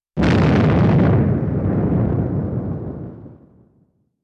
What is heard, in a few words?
Explosion dynamite